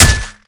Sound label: bottle intact hit flesh, weapons